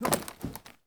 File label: MED HIT; PC Games.EXE November 1997; Legend98